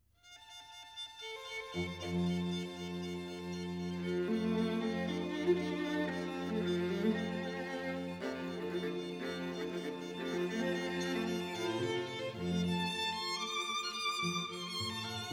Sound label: Chamber Music (Stereo); Denon Audio Technical CD